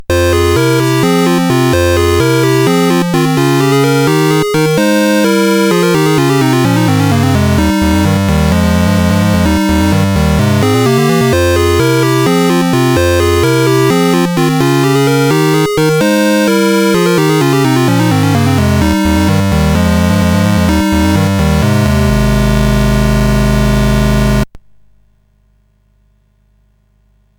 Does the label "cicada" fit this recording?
No